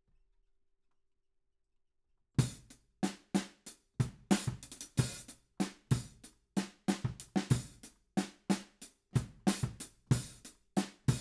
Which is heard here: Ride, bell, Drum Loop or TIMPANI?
Ride